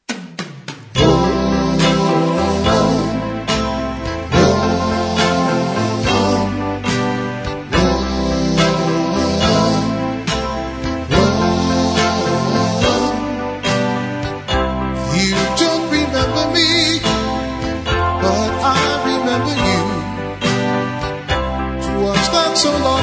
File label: Slow Oldies, One Man Band